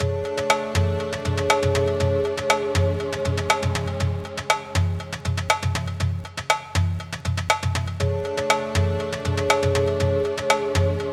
Multitap Delay Nightmare; OFF

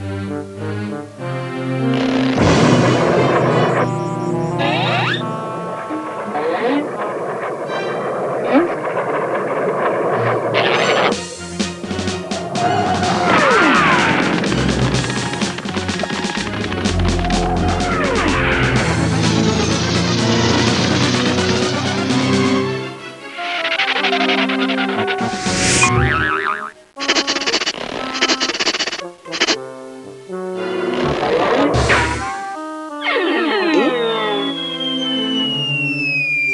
Missile

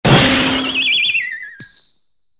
Metal Joint Break; Bad Piggies

